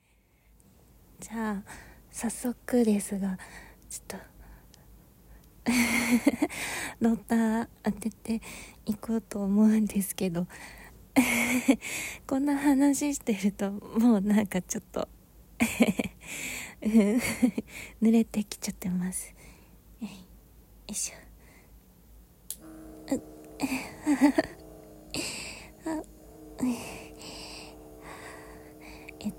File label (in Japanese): 実演オナニー